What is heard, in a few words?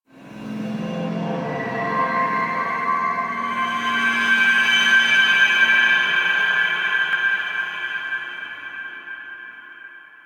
ambient texture; Warframe; Ambience; The War Within; Golden Maw